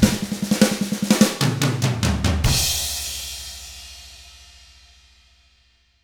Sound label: SHUFFLE, Drums